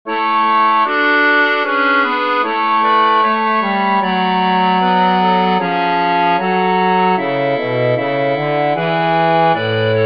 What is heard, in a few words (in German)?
Wer hat dich so geschlagen Bass